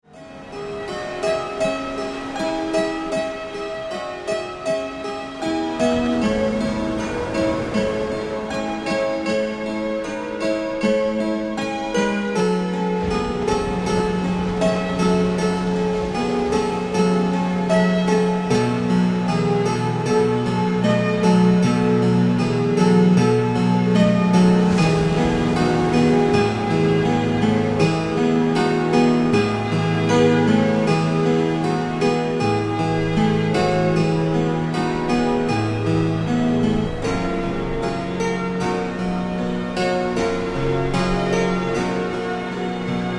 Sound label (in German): Entspannungsmusik